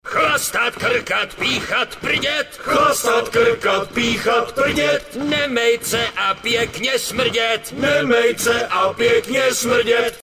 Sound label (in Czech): prdet